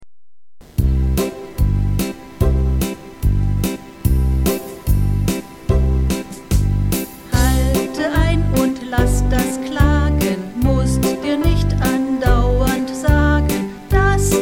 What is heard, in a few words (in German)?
Gesang Abschied